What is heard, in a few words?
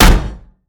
bump